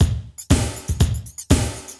CB LOOP; Zero-G; Chemical Beats

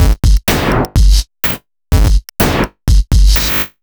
Drum Loops, 125 BPM

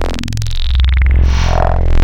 Spectral Growl, wavetables

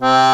single tone C2, accordion

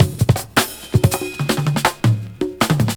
Format 162, breaks; minihalfs

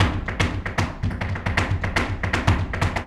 Flamenco, Tapping, Zapateado Solo; ProSamples vol.46 - Flamenco

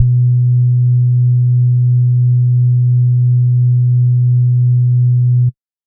SBASS B1, Sub Bass Sine; neuro; Stanza

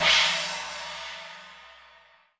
Cym; INFINITEARTH

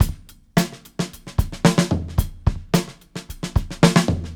Live Beats, R&B Drums; Sonic Foundry (Sony Creative Software)

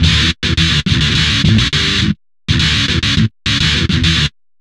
GTR LOOPS

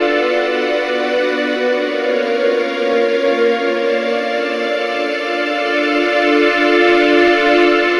Pad, Trance

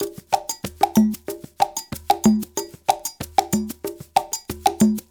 Soukous; Spectrasonics; Supreme Beats; African Contemporary

